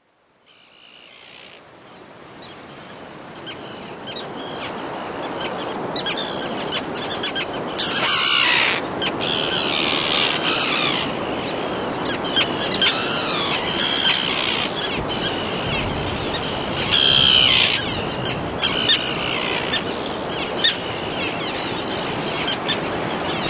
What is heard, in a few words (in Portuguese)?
Garajau comun S Maria Acores